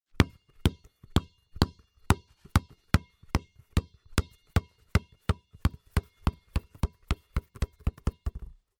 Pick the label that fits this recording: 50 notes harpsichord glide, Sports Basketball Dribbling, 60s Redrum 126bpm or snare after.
Sports Basketball Dribbling